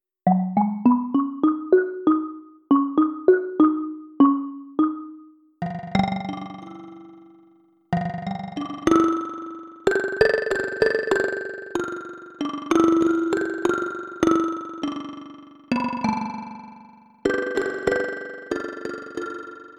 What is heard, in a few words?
modernist xylophone